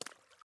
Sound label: water step; teardown ps5 windows xbox series xs gamerip 2022